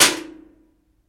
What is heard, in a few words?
Metal Clank Inside